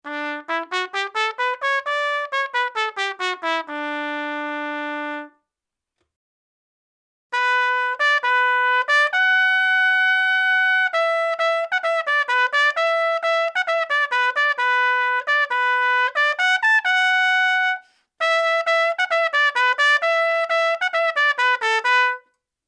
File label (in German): Trompete